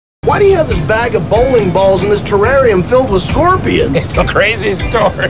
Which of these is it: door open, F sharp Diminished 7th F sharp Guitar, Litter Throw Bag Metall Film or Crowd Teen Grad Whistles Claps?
door open